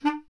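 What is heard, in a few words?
wind bass clarinet; Miroslav Vitous - Solo Instruments VOL-1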